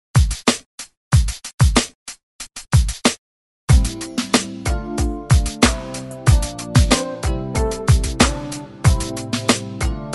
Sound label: 80s ReDrum 93 Bpm; DJDOLLS